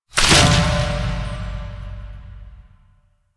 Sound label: Boon Cancel; Warframe; Koumei